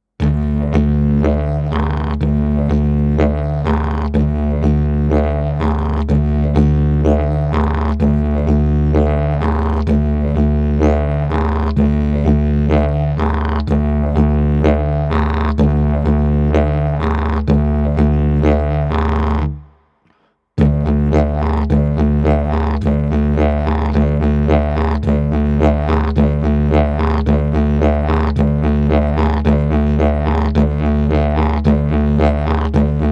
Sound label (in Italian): ritmo livello principiante